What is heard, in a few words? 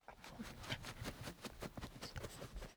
scratch ear; Rust